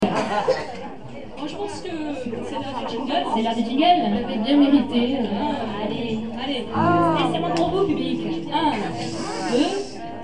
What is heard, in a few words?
jingle; riverside